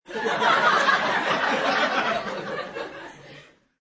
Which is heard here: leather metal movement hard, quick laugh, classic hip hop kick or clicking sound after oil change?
quick laugh